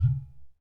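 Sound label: UDU RELEASE, African Perc; E-MU Formula 4000 Series Vol. 4; Earth Tones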